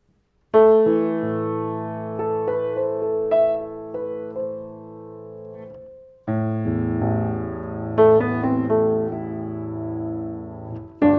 Piano

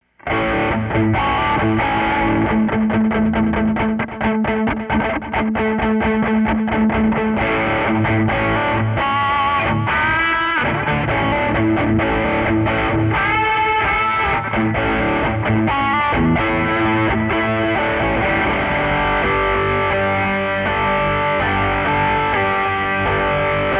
Rock, Gibson ES336; Rock in A

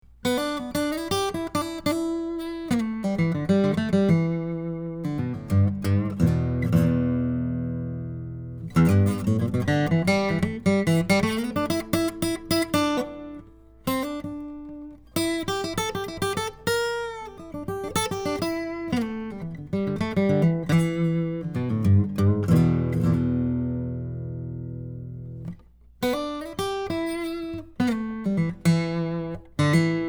Boogie, Neumann KM84

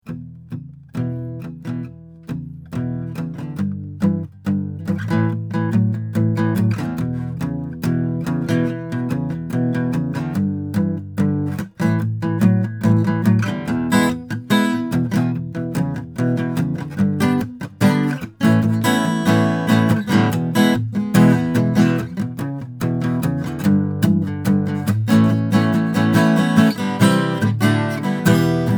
Rock, Gretch

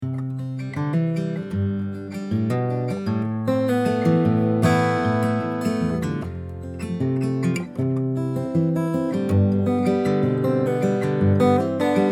Fingerpicking; MBHO

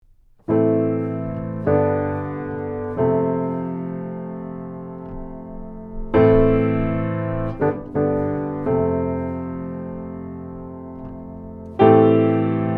Piano

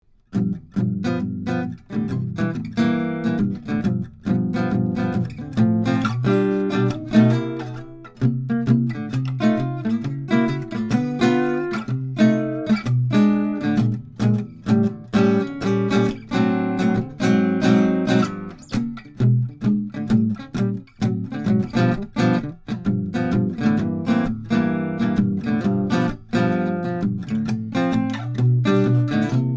Jazz; MK8s LDM

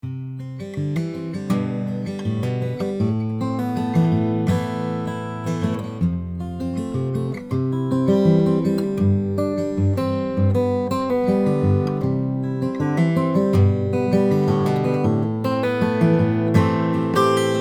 Fingerpicking; SANTA CRUZ OM/PW AC. GUITAR; Neumann KM84

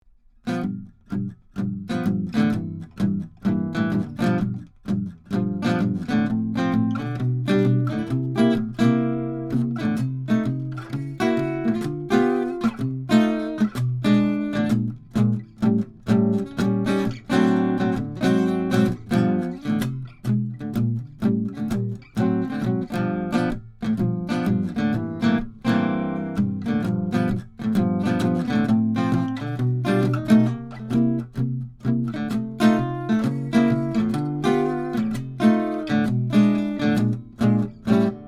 Jazz

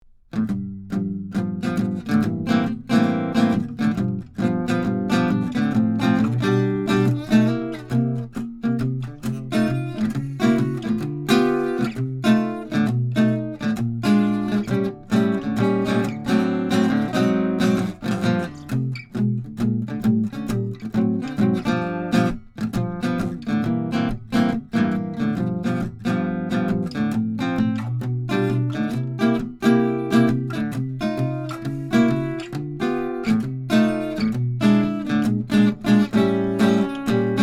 Jazz

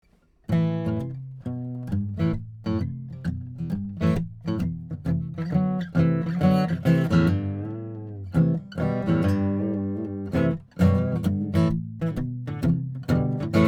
D15 Ocean Dog Walk; Original, in Am; Martin D15

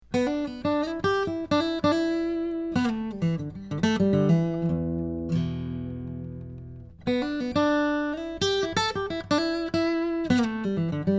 Boogie; AMI UM25 Proto

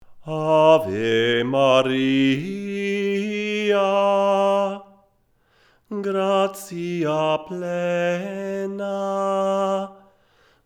vocal; AMI; Schoeps CMT541

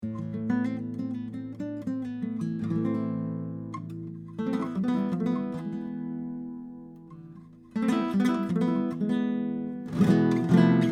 Flamenco; Bartolex

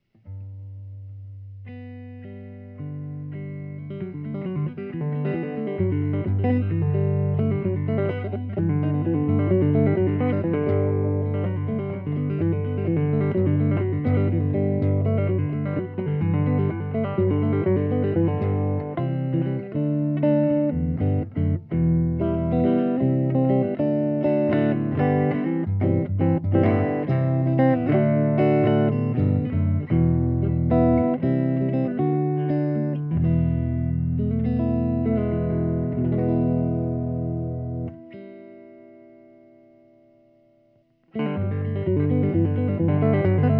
Country; (Original, in G); Benedetto; electric archtop

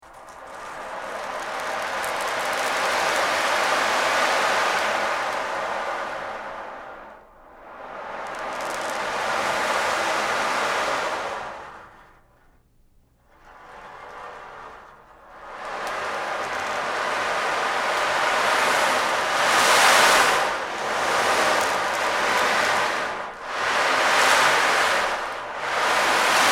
Rain

